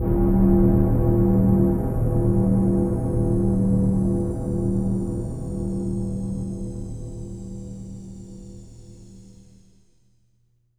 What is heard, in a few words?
SYNTH BELLS; Zero G; Malice In Wonderland